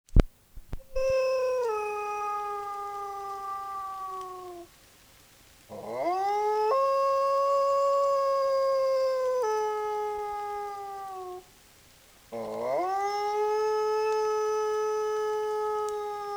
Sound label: Animal music